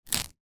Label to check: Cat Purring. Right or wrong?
Wrong